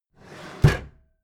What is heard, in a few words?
packed drawer smooth clatter open heavy